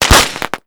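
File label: bullet cookoff, weapons impact; Call of Duty Modern Warfare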